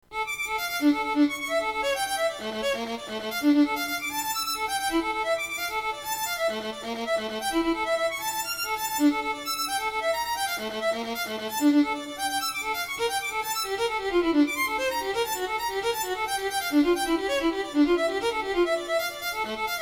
Sonata for Solo Violin in the Classic Style

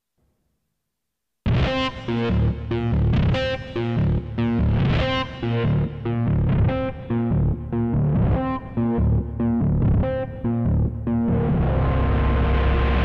noise funk improvisation

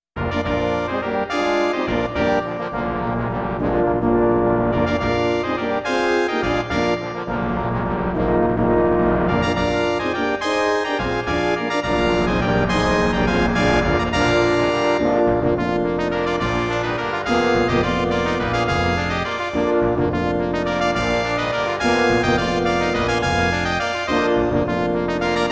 Fanfare for Large Brass Ensemble

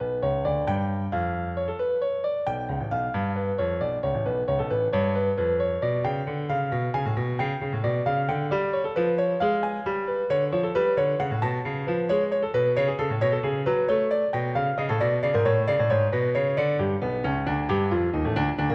Piano Prelude

